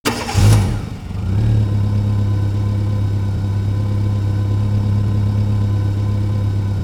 Car Starting Idleing Fading

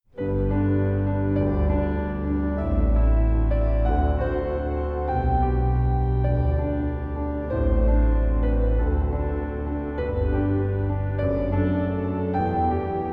Grazioso

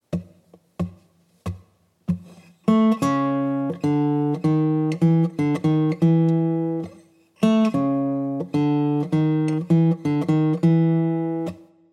Blues Guitar Turnaround

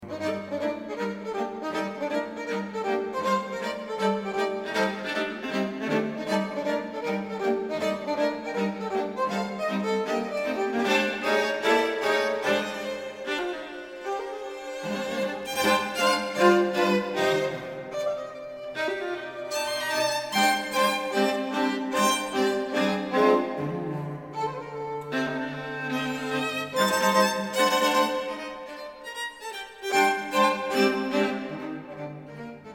String Quartet in Bb

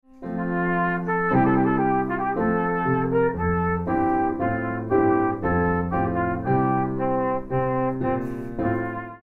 trumpet volentary

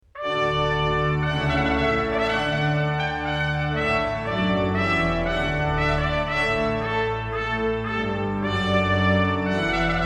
Trumpet Voluntary

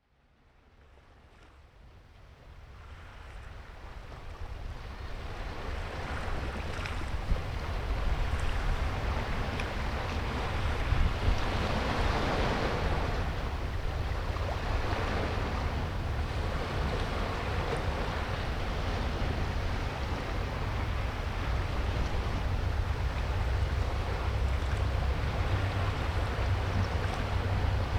schhh

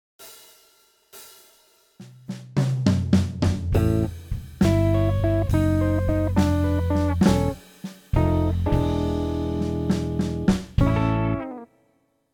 Chicago Blues Guitar; Chicago Blues Guitar Lesson

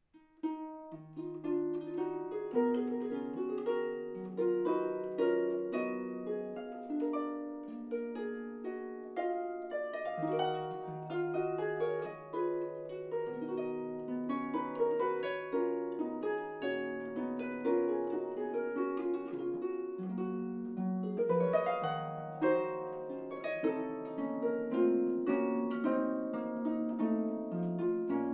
Courante

